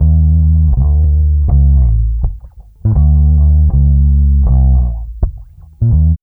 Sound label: BASS, Funky Ass Loops; East West